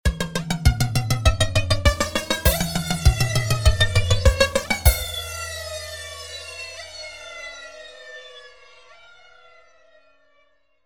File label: drums flanger; FX Story le flanger